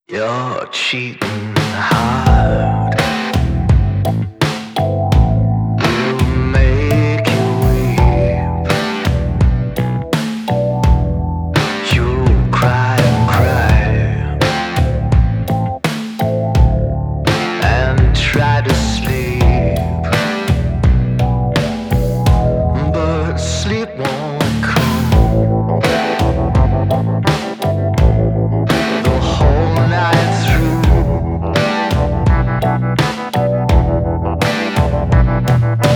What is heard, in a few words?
rock roots rods loose natural, drums